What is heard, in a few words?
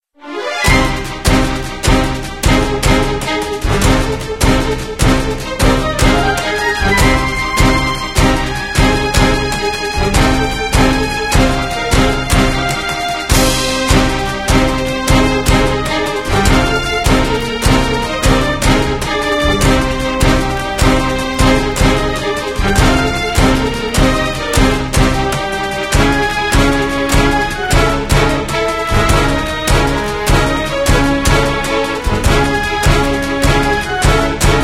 Rpg Battle Music